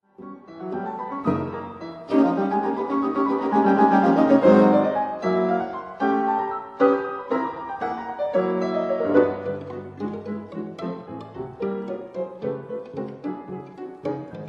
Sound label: Elegy for piano trio; Chihara